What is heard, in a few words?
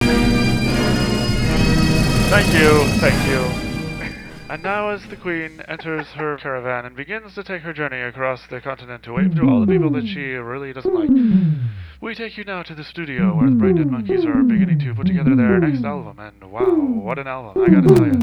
Shut Up, I'm Tuning My Organ!